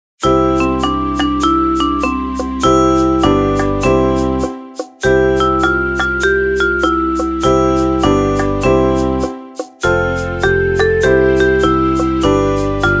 Full Ensemble

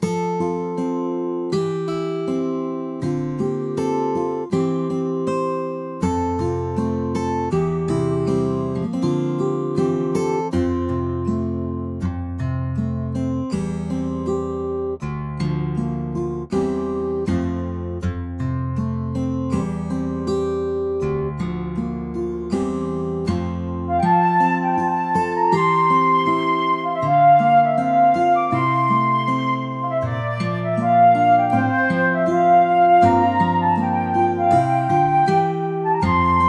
80bpm; Su O Gan

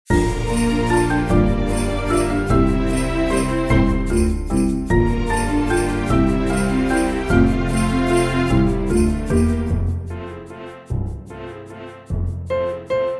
150bpm; Let's Play Our Instruments

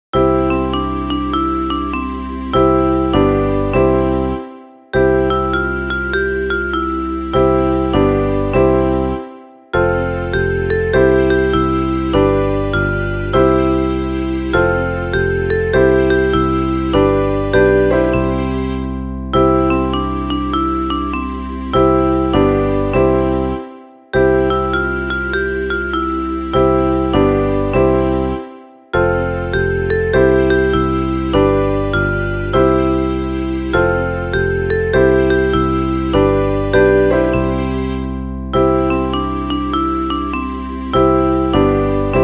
Piano Ensemble

